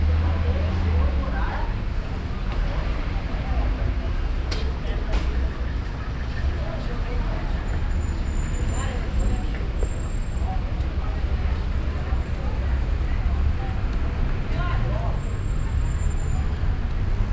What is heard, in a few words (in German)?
an einer ecke in sp, Brasilien; Fieldrecordings